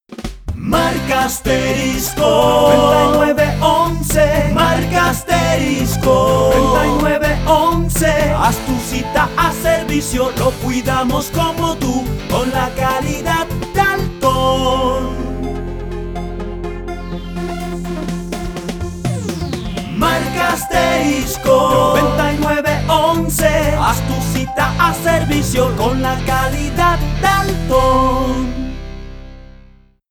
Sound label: Jingle; Dalton